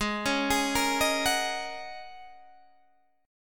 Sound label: G sharp 9th Suspended 4th, Guitar, Open D